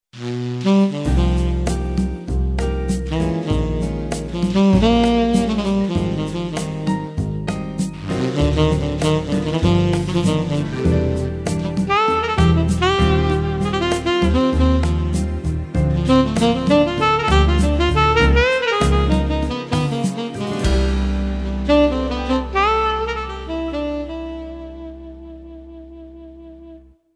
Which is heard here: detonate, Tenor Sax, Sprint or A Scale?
Tenor Sax